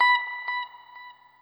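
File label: noodle short, guitar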